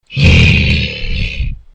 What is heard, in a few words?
bear roar; animals